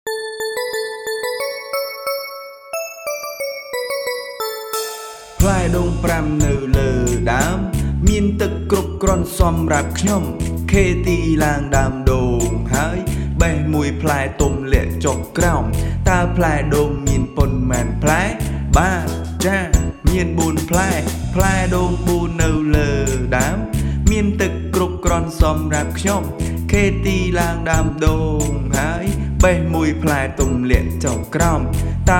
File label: Counting Down Coconut Song; បទចំរៀង-យើងរាប់ផ្លែដូង